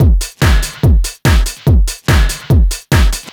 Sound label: Dance Drumloop; Drums; Dance Beats